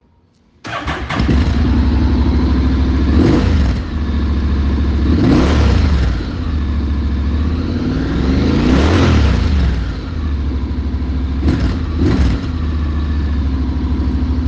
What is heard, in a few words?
HONDA Forza 750